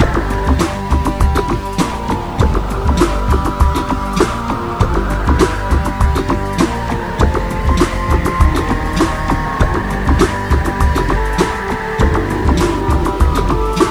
nuclear mission jam loop; dm cardboard

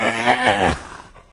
pain; npc; repeater

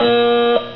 metal buzzer; cs showdown

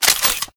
colt clip out, weapons; kuilu

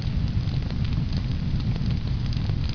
fire looped; de aztec run

